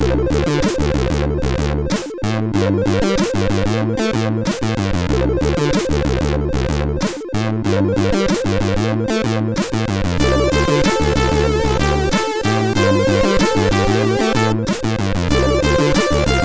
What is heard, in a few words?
sid, MOS6581R3